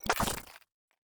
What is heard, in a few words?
tech pickup; esmteam